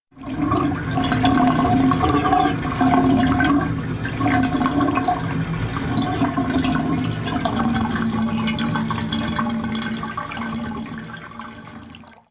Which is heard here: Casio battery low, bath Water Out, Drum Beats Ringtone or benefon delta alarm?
bath Water Out